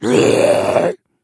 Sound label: slash; cstrike